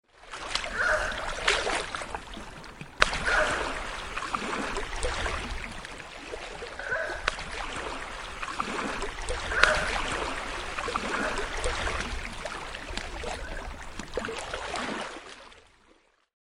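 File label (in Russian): Нерпа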